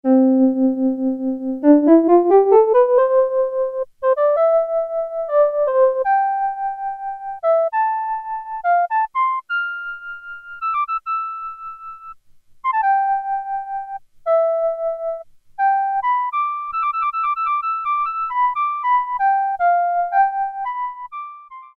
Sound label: FLOOTE, Kawai SX-240